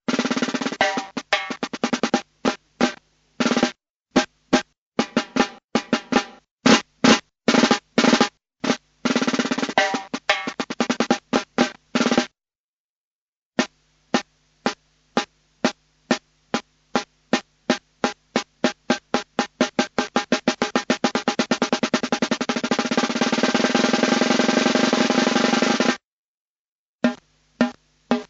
altri tamburi

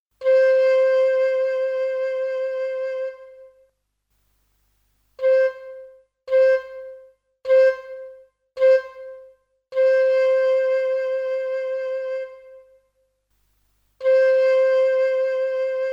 esercizio dettato durate

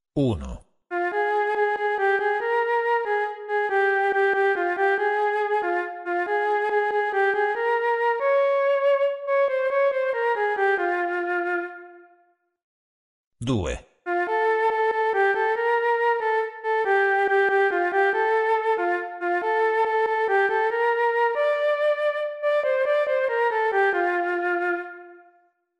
esercizio maggiore o minore

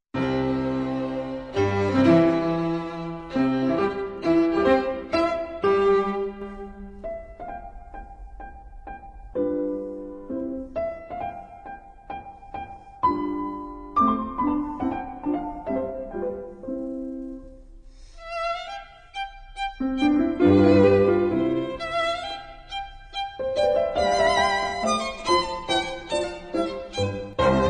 esempio trio

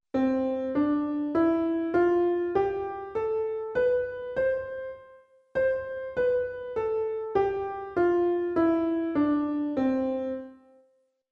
esercizio scala Do giusta

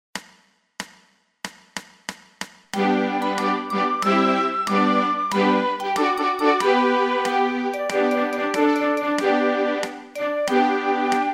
Inno Cina; Sonora vol A

